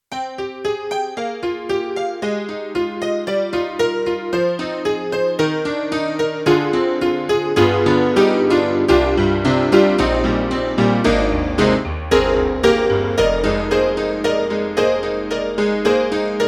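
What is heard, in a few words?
YAMAHA MDP-5 XG Honky Tonk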